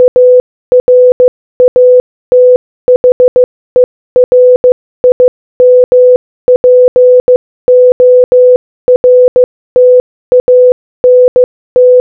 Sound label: A RATHER IMPORTANT Morse Code